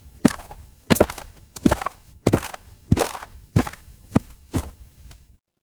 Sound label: footsteps gravel